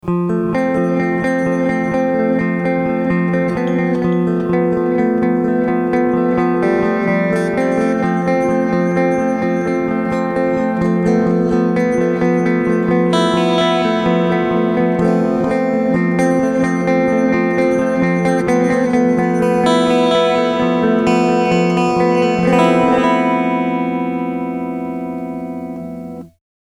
Reverse Stereo Pult

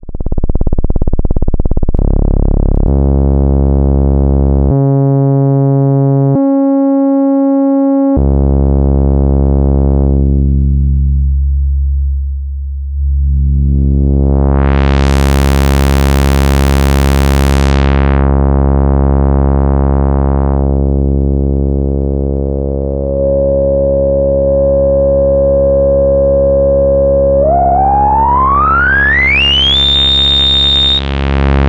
Behringer 110 VCO Saegezahn Range und Filter

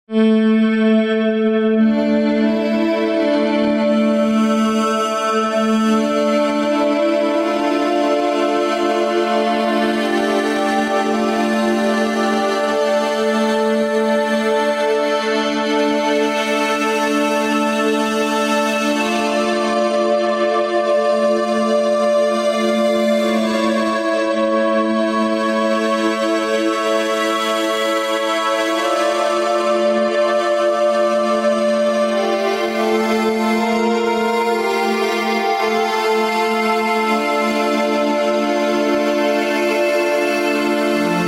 WALDORF IRIDIUM KEYBOARD Werksound

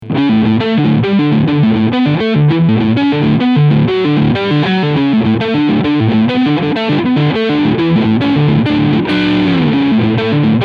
Gibson Les Paul Standard Crunch Sound beide PUs

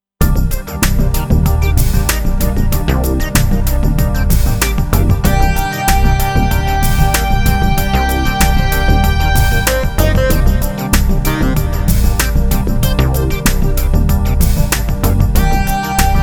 SSL 2 Kronos Stereo panned und 4K

